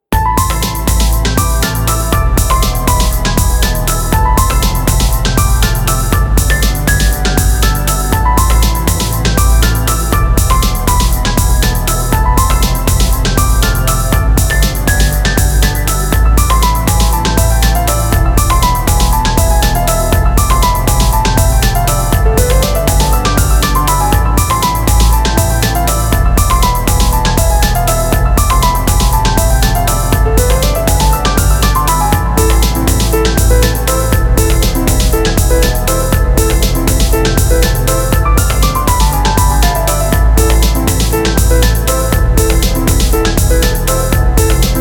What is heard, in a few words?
Cry of the soul BPM120